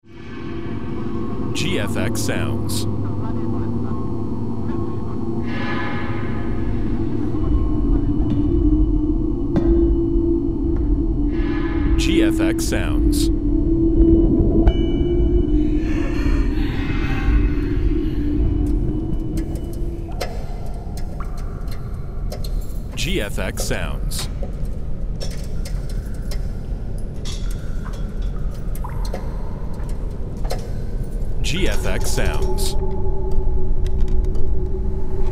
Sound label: Sinister soundscapes; sfx pack